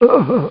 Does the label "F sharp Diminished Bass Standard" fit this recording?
No